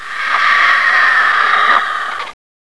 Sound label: scream; match